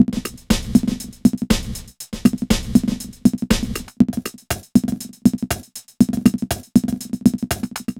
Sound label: NRG Break, Trance, Drums, Breaks